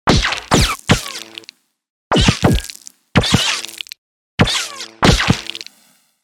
Shoot Laser Gunshots Crossfire

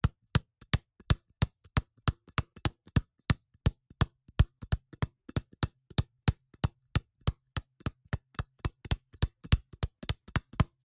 Small Ball Dribbling; BOOM Library; Balling

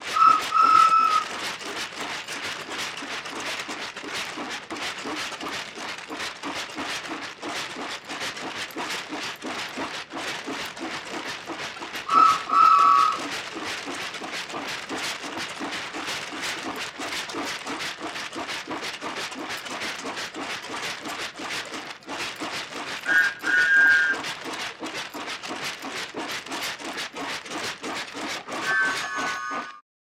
Cartoon Toy Steam Train Choo Choo Chaka Chaka; Omnibit Sound; Cartoon Mania

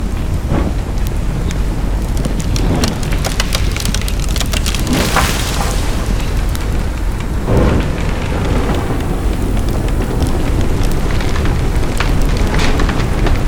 Building on Fire Structure Collapse; OG SoundFX; Force of Nature

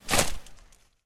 Soccer Ball Hitting Net; Stuart Duffield

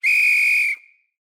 Blowing A Whistle long; Ni Sound; Filmmaking Props

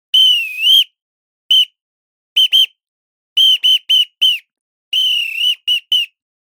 Referee Whistle Coach Variation; Michael Anctil; Ball Games

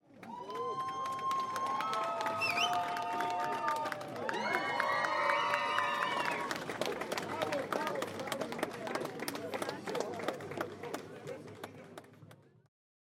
Crowd of People Applause in Street Event Cheering; Articulated Sounds; Worldwide

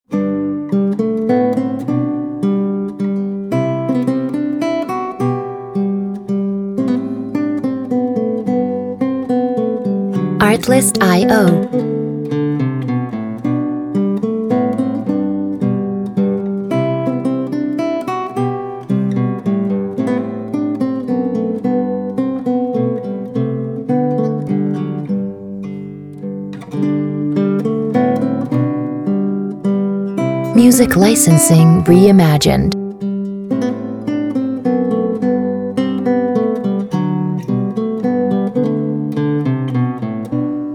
Minuet in G Major; BWV 114

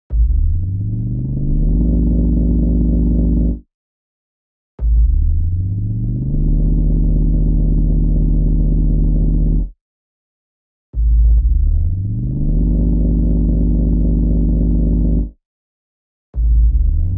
Teleportation Sci-Fi Engine Turn On; Just Sound Effects

